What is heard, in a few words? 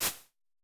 Shakers, Vermona Mono15 Drums